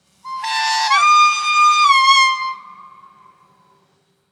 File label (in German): Klingelton; Roter Blitz